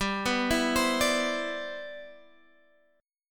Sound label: C Major 9th, G, guitar chord